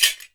AFRICAN, African percussion; Voice Crystal - Steve Reid Definitive Percussion

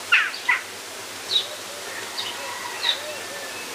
Birds Various; outdoor

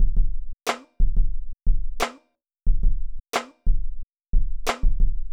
junkyard rhythms, drums; Sonic Foundry (Sony Creative Software); Vital Drums

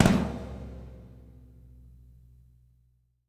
TOM 2N R, ROCKER KIT

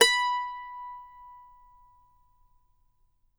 MANDO NOTES, B5; Sampleheads; Whole Lotta Country 1 & 2

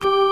60's Organ

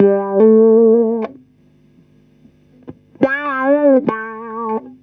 GTR, Roots Disco; USB Soundscan vol.32; AKAI

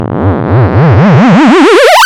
Misc Short FX

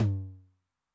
Noise Med, 909 LOW TOM

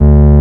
Taurus II, Moog Taurus; Greytsounds VOL-1

